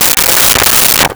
Soda Can Fall; Crashes; 1001 Sound Effects

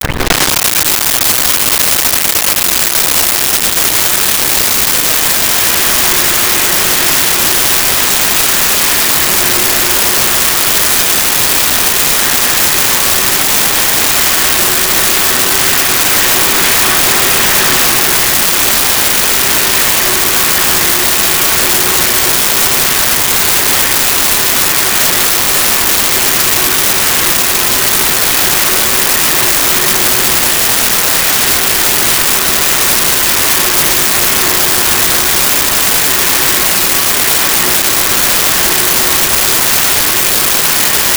Vacuum Cleaner Constant; Home & Office

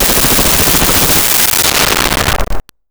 Machine Gun Bursts; Weapons & Explosions

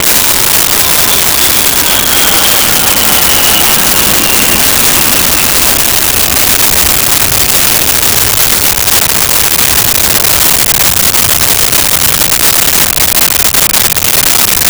A10 Attack Jet; Aircraft

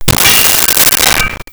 Sword Swipe And Klang; Weapons & Explosions

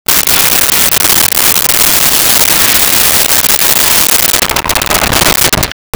Slot Machine Jackpot; Vintage Cartoon; Foley

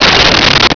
weapon gun; tusken

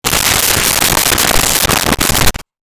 paper rustle

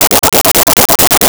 Arcade Movement; Arcade and Games

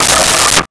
Wood 2x4; Tools; 1001 Sound Effects

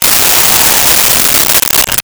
Electric Drill; Tools; 1001 Sound Effects

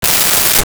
hair spray; Bathroom

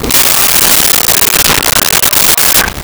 LoFi Synthetic Squawk; Vintage Cartoon; Comedy Vocals; SONY PICTURES SOUND EFFECTS SERIES

